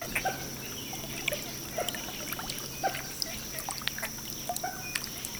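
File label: keep, Common Moorhen; National Marsh Bird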